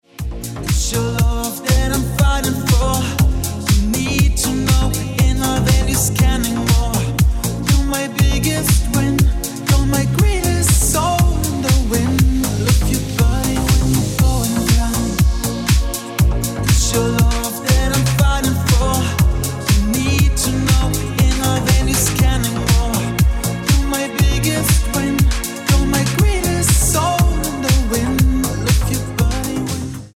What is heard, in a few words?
Remix Ringtone